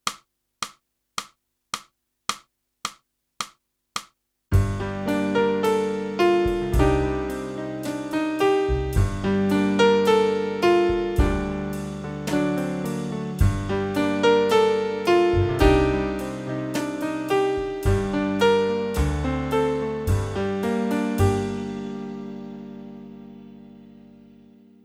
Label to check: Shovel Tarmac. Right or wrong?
Wrong